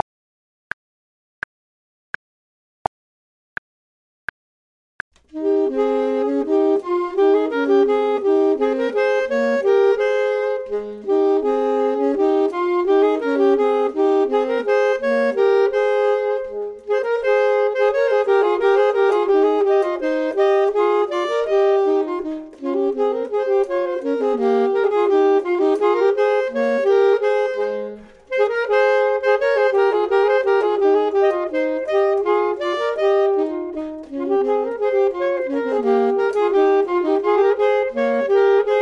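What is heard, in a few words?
both, Saxophone and Clarinet; voxman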